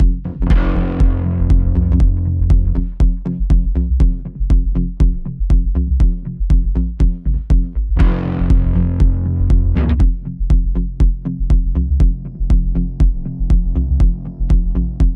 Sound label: Drum Bass Bus Transient Shaper